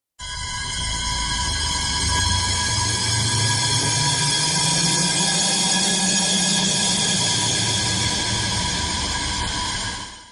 suono spaventoso scary sound horror sound scary creepy sound